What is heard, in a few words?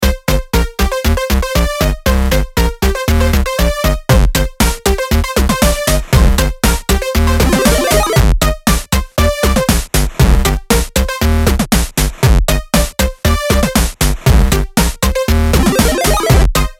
8-bit arcade music background music